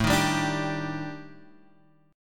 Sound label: Eb Major 7th Flat 5th A, guitar